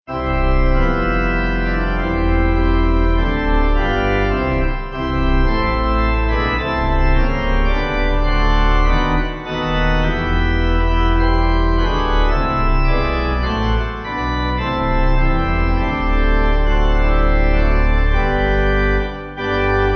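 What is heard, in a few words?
Pipe Organ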